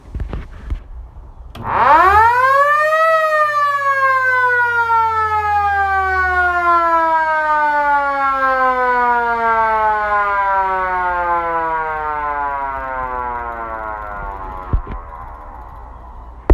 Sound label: Air Raid Sound